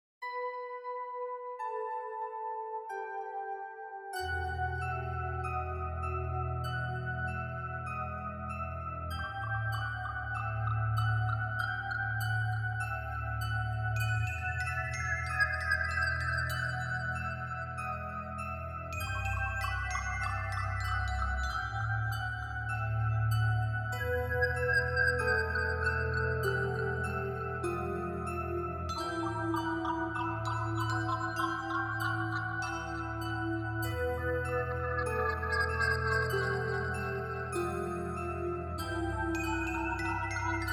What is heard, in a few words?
Checkpoint; Underhell Chapter 1 original soundtrack 2013